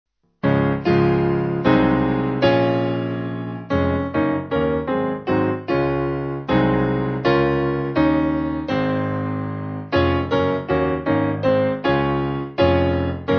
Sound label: Let All The World, Piano